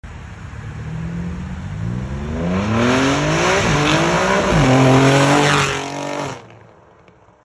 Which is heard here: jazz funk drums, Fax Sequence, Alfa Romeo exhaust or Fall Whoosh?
Alfa Romeo exhaust